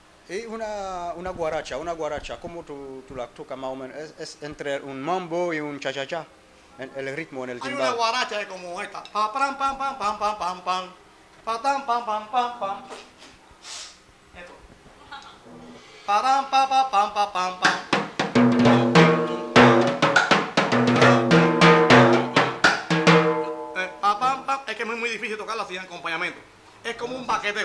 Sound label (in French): musique Guaracha aux timbales